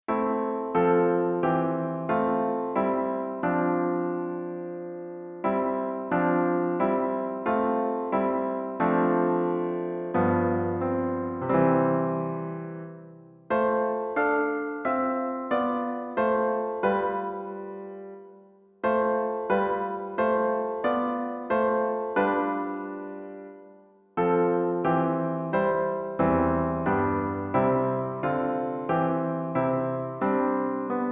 baroque suite c major for piano harpspichord choral epilogue; antonio tudura